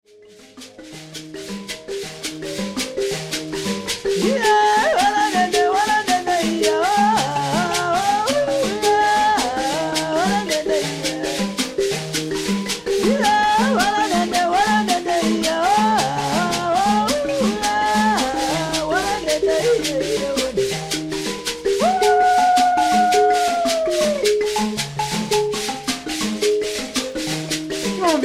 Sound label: mbira